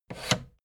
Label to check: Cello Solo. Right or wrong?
Wrong